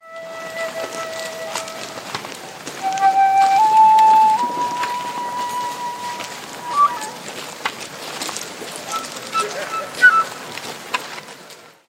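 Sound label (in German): waldspaziergang hambacher forst flötenspiel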